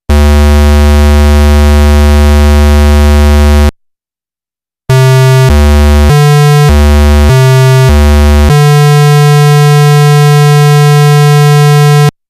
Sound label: Nord Lead 3 Raw Square Wave; Nord Demos; Nord Lead 2 versus 3